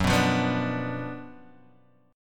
F sharp Major 7th Suspended 4th Sharp 5th, F sharp, down, Guitar, Drop D